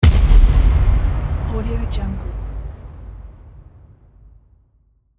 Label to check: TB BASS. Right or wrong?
Wrong